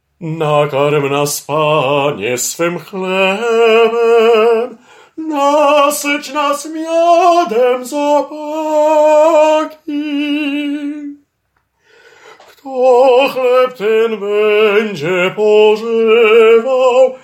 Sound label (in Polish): SOPRAN; Nakarm nas Panie